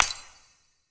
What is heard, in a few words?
clap; smite; daji